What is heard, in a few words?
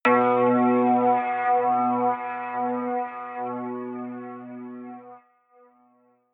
synth hit phase wobble high; whooshes rises